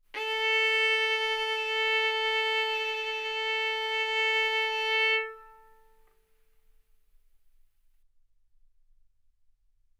Violin arco A4 B4; mf; sul A; stereo

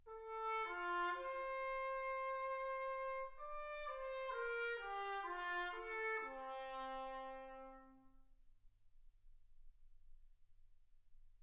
trumpet

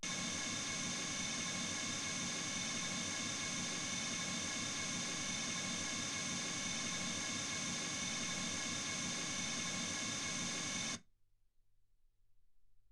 mls16 48kHz

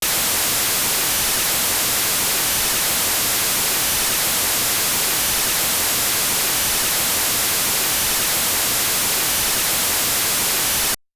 mls16 48kHz; OmniPower4296

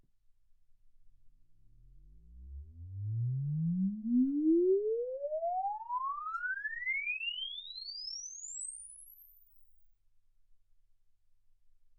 exp swept sinus 10Hz 24kHz

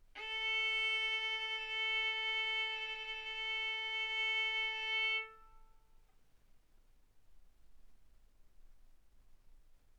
Violin arco mf sul A A4 B4 stereo

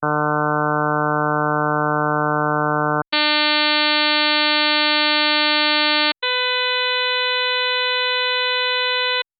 harm sinus 48kHz

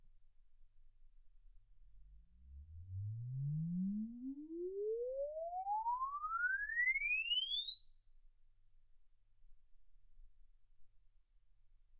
exp swept sinus 10Hz 24kHz

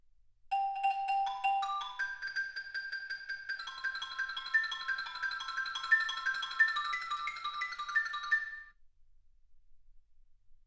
xylophone

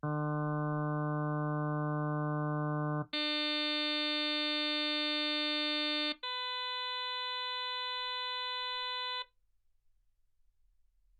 harm sinus 48kHz

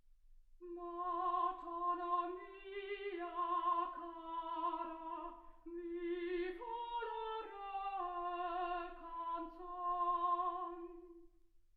soprano; single mic

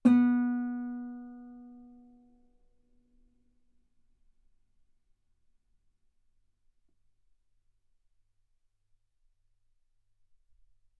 Guitar B3

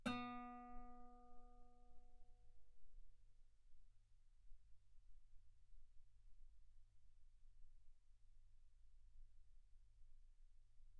Guitar B3; ff; sul B

